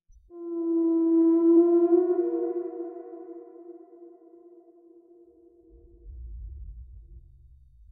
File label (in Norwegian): hval lyd uhyggelig